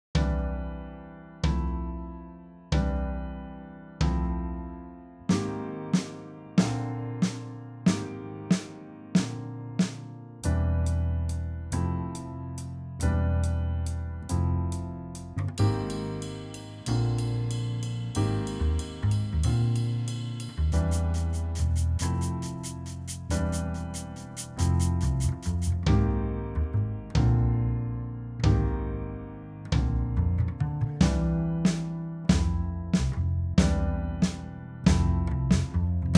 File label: different beats simultaneously